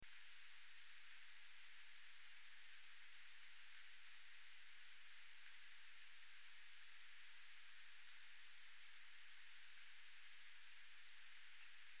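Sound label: Chalinolobus morio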